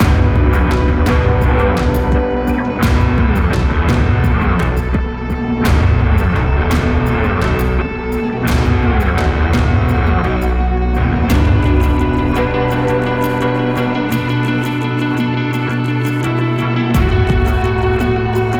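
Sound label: Music Ambient, Ambient Pre Race 85bpm; Music Amb 1#2 (Pm Amb Pre Race 1 85bpm Game Mix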